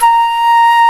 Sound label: SOLO WINDS